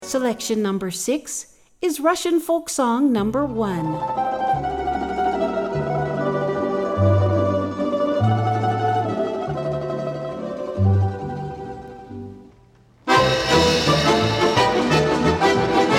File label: Russian Folk Song